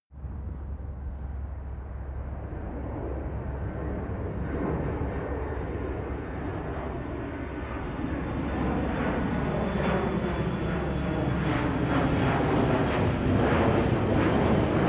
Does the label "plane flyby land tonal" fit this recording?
Yes